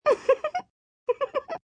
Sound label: Laugh, female, high-pitched snicker (human voice); Laughter